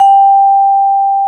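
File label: A long G4, vibraphone; AKAI S-Series CD-ROM Sound Library VOL-7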